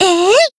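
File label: Voice attack, Japanese; pizzica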